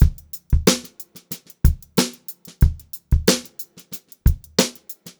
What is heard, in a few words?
BEAT, Funk-Groove Drumloops